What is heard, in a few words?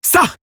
pain, female